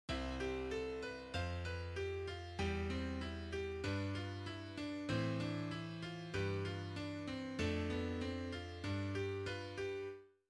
counterpoint a4

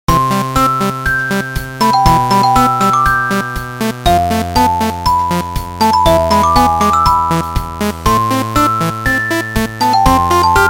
baby disco

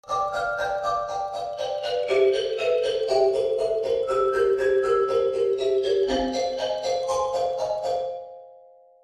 kalimba

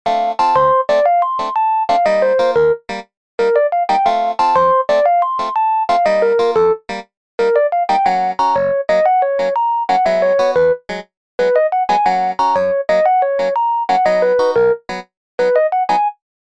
clavinet

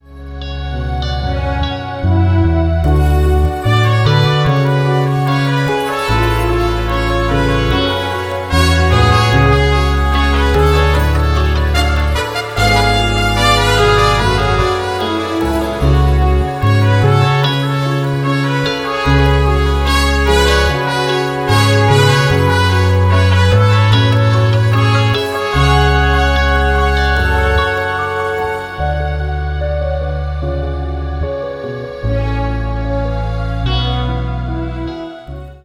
modal piano; fluidum

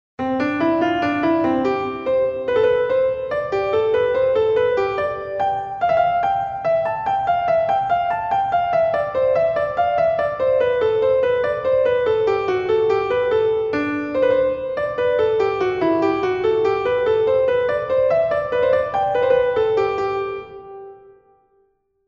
Counterpoint Exercise

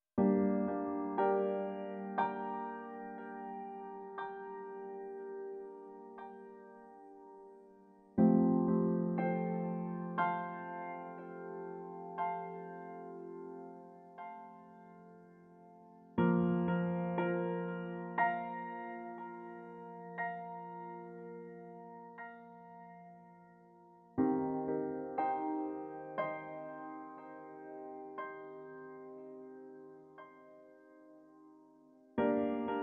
ascension piano; eagle halion nadoen

